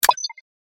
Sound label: click; Max Steel - Match And Destroy